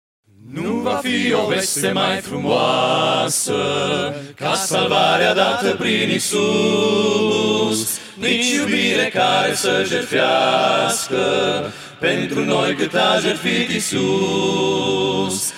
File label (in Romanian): Quintet vocal; Arad; Duminica dimineata - nunta